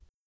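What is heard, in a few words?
bass drum, drums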